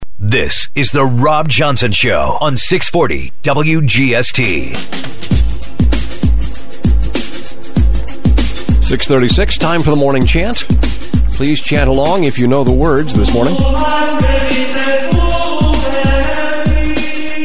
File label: morning chant; Atlanta